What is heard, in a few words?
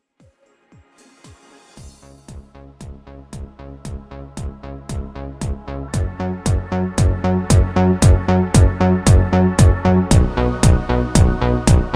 italo disco instrumental euro disco; roland ea7; 80 90s